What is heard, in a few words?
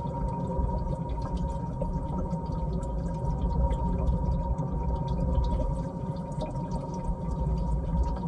robot cylinder; Titanfall 2